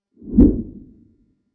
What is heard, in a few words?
send whoosh